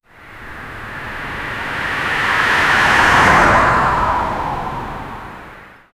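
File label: night forest road apocalyptic; apocalypse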